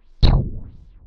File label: teleport; Wackelpeter